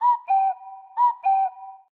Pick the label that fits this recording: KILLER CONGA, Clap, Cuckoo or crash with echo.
Cuckoo